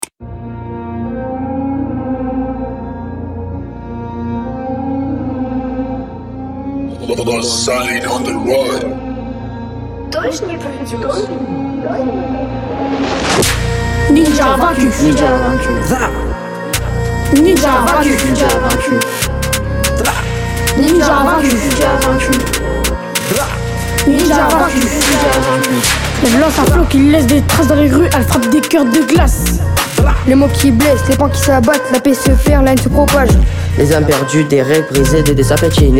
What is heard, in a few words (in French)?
RAP; Ninjas Invaincus; les jeunes du lalp ecrivent et enregistrent leur propre rap